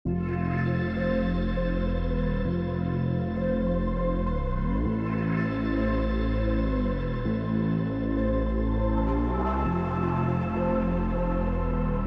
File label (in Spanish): reggaeton